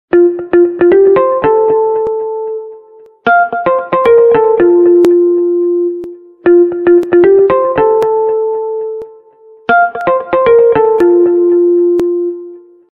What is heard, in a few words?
iphone 14 pro max velvet tones